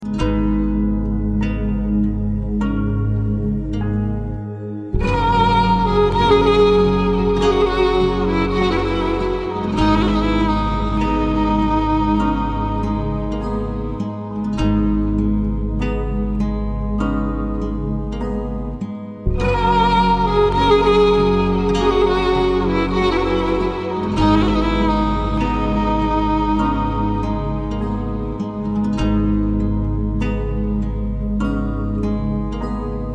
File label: violin